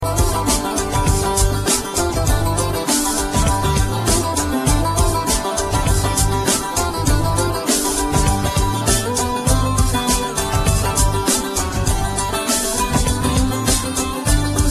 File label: ottoman sufi music